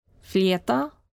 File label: flute